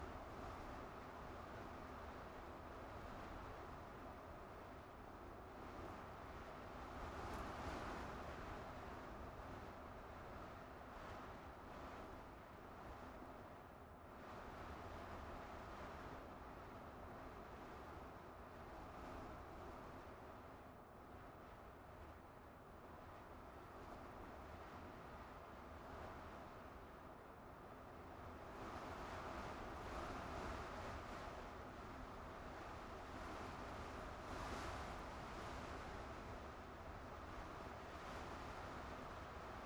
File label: Wind Thin